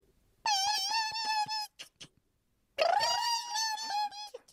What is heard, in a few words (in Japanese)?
オオルリのさえずり; 山藍鶲的鳴叫; 声マネキング; 声マネキング対決; フブみこさん; ホロライブ; さくらみこ